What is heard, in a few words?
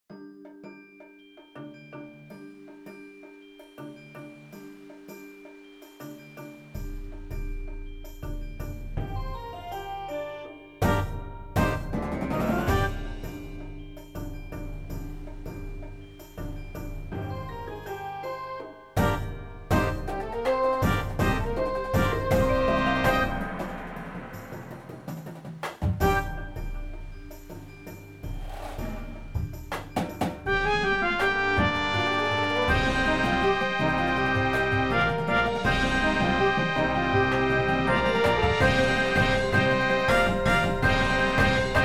Ocean Riffs